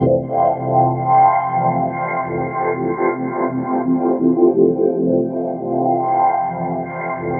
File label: ECHOPLEX